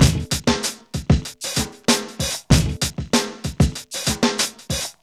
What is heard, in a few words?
REAL FUNK 96, Funky Elements LOOPS; Zero-G